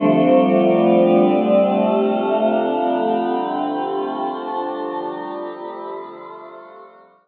timbral sweep

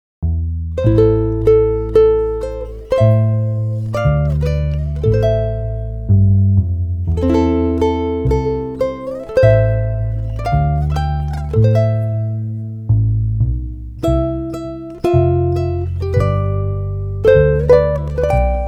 el puerto guitarron ukulele

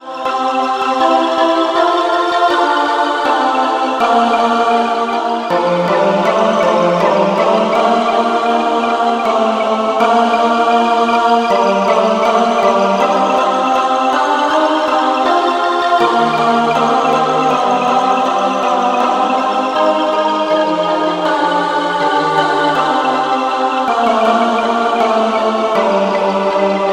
vinyl chorus

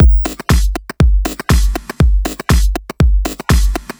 multi dry

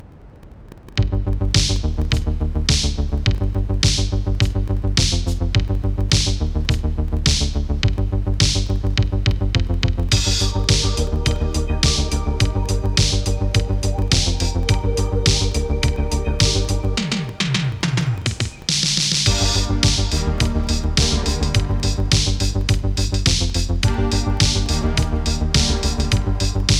lo-fi synthwave; v1nylla

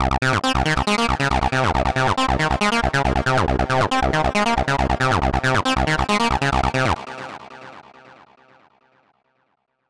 spacing out

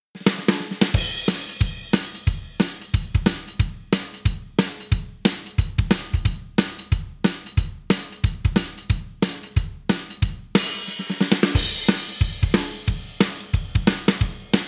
uptempo

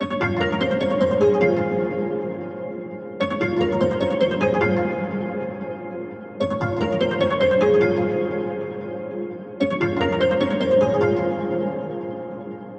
keys patch

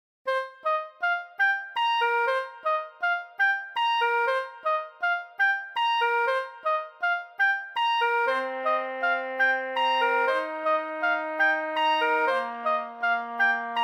oboe; black jack

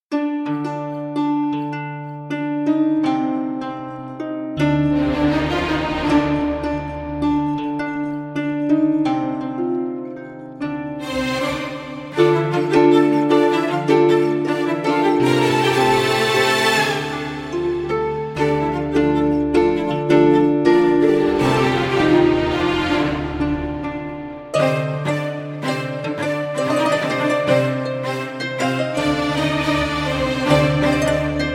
arabic strings

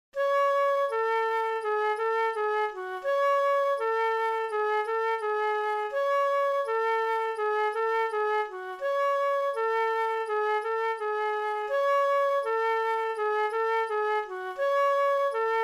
flute; shark bait

